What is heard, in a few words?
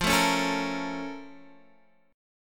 A sharp Minor Major 7th